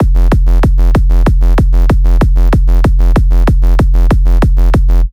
KICK BASS F LOOP

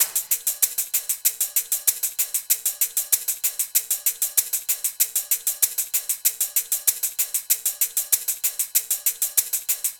PERC LOOP

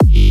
Kick Eurobass G One shot

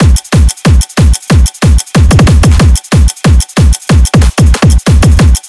Kick Loop F

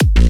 Kick Bass A Dancing Double

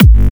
Kick Bass Dancehall G

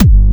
Kick Bass Subs G

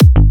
Kick Bass G

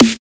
Tek Snare A Run Tune

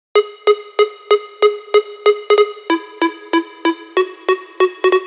Tek Synth Bleeps

